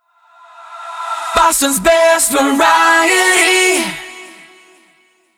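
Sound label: 122bpm MIX, jingle; WBMX 2017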